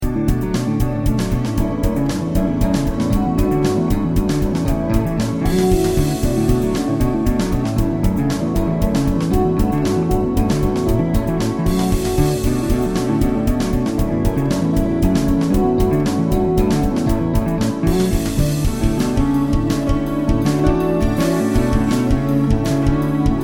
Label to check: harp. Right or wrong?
Right